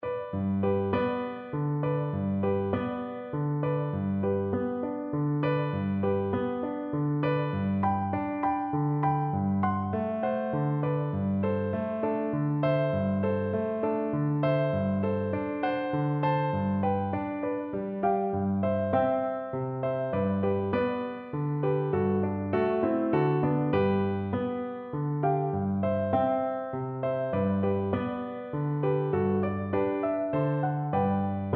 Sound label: Oboe; brahms lullaby